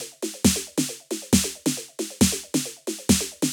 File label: Techno Snare; Dance DJ; Drums; Pro Tools Essential Loops; Digidesign